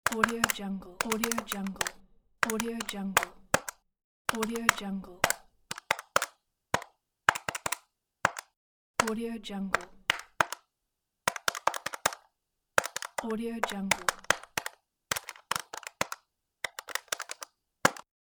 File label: calculator